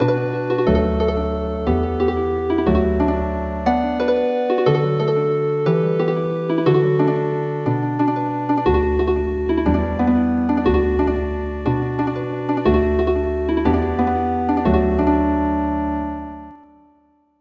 Healin Harp

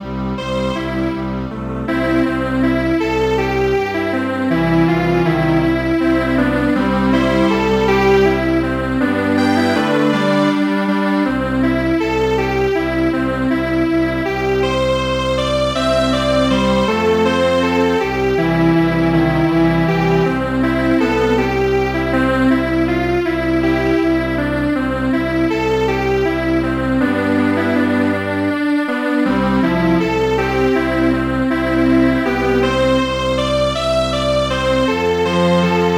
Strings Quartet, traditional; The Campbells Are Coming

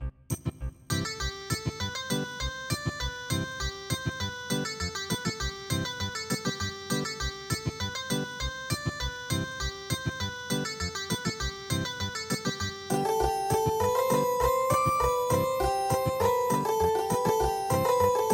Ethnic Guitar; your beauty

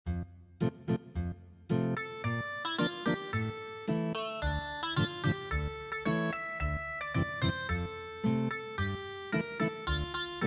Ethnic Guitar

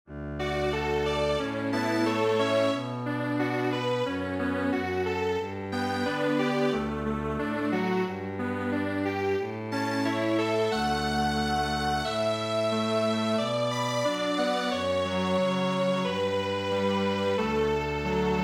Canon in D, Strings Quartet

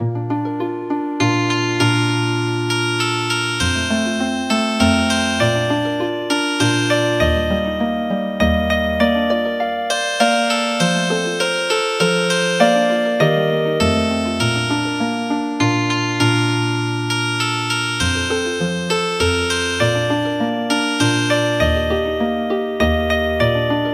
Healin Harp

